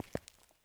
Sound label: FOOTSTEP Asphalt Trainers Walk Slow mono; Phasmophobia